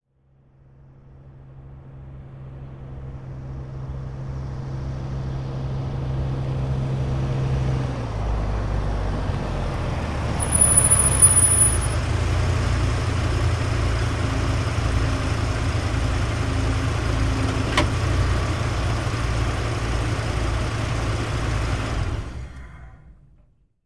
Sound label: Dodge Ram: Slow In, Idle And Off, With Brake Squeaks.